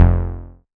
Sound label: bass F1, Roland MKS-80